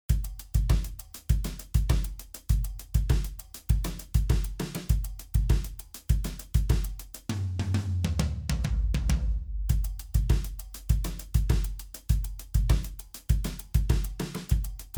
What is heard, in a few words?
balafons; st thomas complet